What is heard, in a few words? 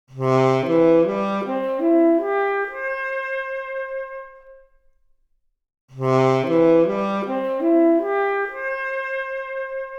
Saxophone arp, mp Stereo